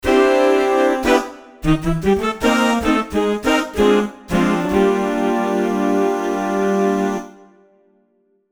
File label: Big Band n Scat